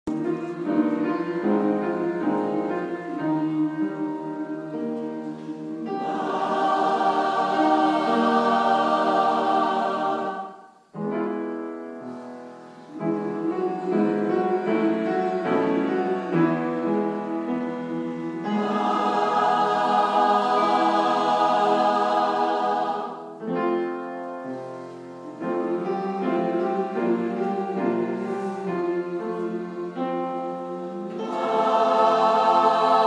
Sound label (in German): Einsingen